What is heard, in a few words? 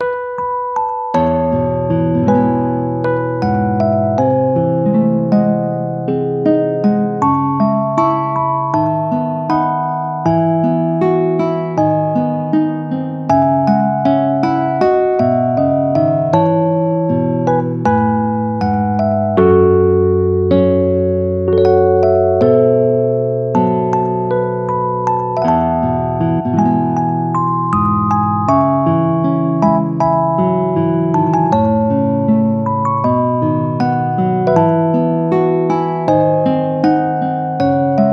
Dream Whisper Healing music to relieve the tiredness of the day